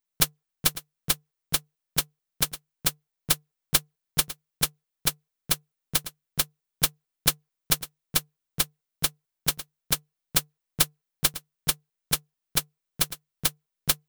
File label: 136 Short Hh; Zero-G Trance Inducer; Construction Kits; 136bpm